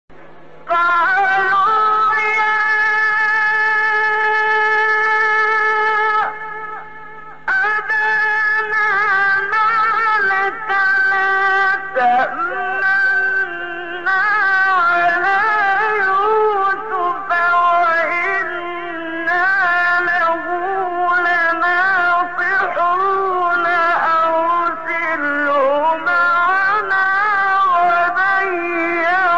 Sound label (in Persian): تلاوت زیبای آیه سوره یوسف; آیه 11 12; استاد منشاوی